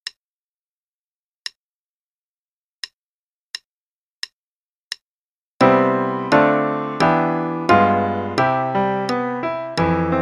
My L.H. C Scale (both parts, qn=88); Level 0 - 0 Primer, Book A - page 72

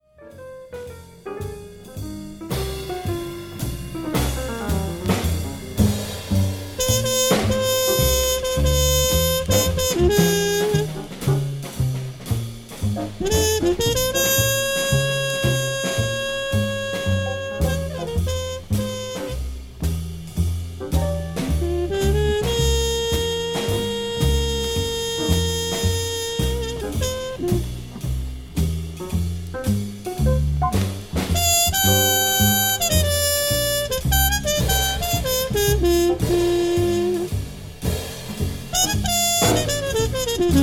solo only, jazz trumpet

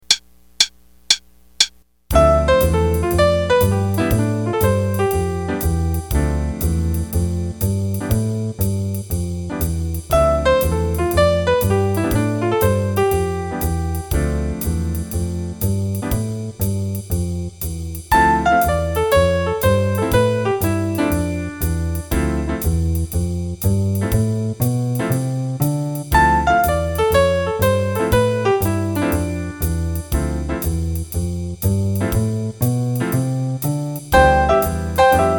Improv Dmin 32 with Jazz Rhythms; D min7 (D Dorian) (32 bars, qn=120); Trading with Jazz Rhythms, Set 3; Jazz Improv Play-a-Long

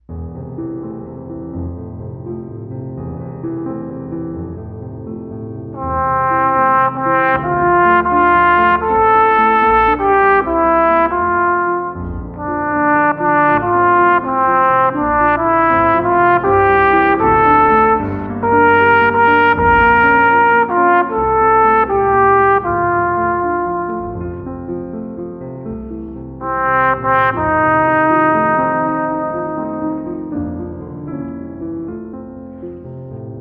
in F, trumpet; Stauss

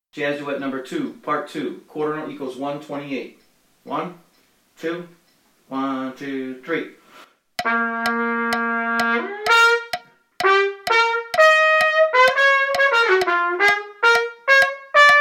jazz trumpet duet; TTN Jazz Duets; Jazz Trumpet Duets! (#2)

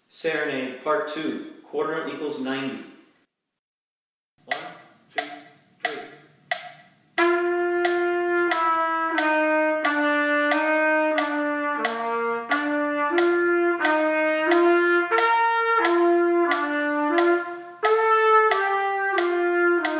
Serenade, qn=90, trumpet; Rubank Int

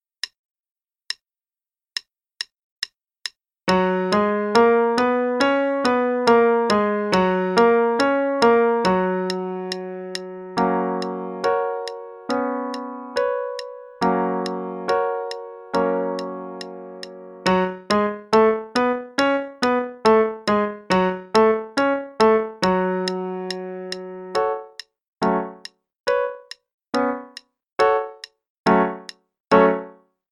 Warm-up in F sharp (Major then minor, qn=140); minors are staccaco; Faber; Level 2A, 2nd Ed p.63 - F# Major, then f# minor

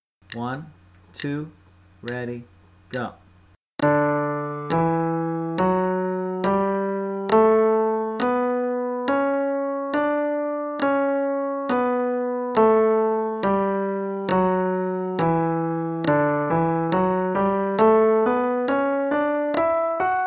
D Major Scale; CM Level 2

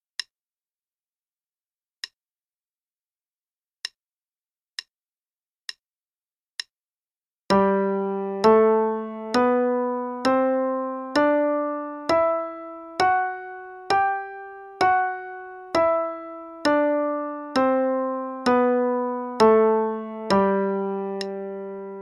G Major Scale (qn=66); CM Level Prep; Lesson Books

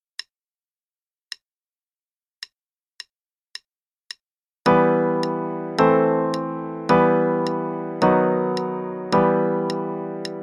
e minor chord progression (qn=108); CM Level 2

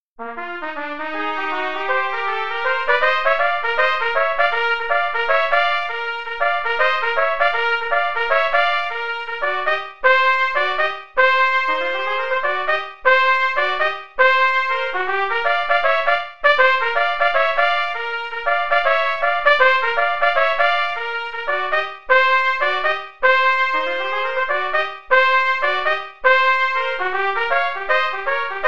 Play-a-long, Bop Duets, qn=160, trumpet; Bower

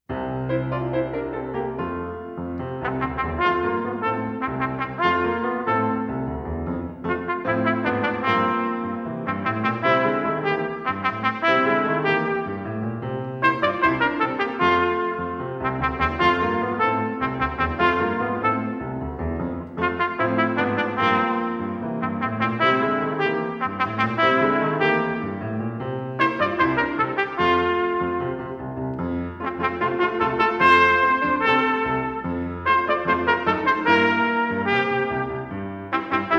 La Cucaracha performance; canadian brass easy solos

